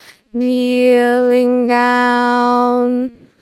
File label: singing